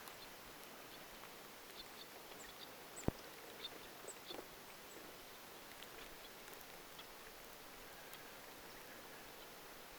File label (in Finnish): pikkuvarpusen ilm lentoaania